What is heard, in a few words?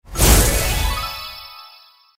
lightning strike; Candy Storm